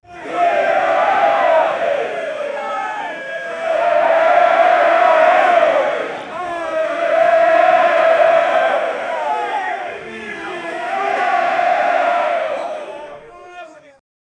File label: a crowd at a wrestling, cheering